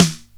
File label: original snare single hit f key, Royality free steel snare drum tuned to the F note.; snare drum samples